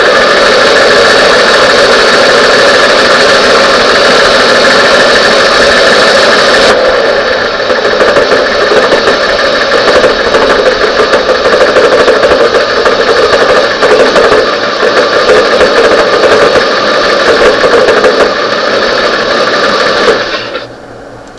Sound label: knock; 78 Newport; Trans-Plant